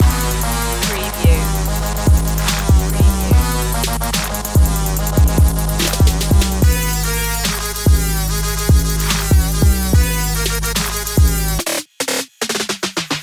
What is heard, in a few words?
808 boom loop